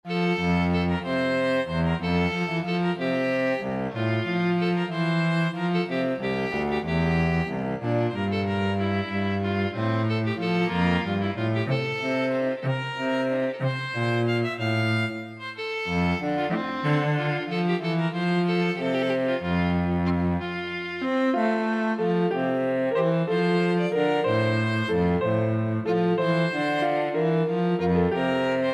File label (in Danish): forspil 2 stemme F dur; Den kiedsom vinter; Ambrosius